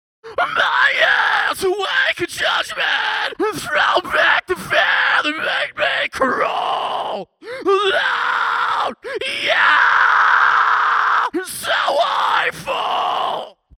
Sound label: scream no fx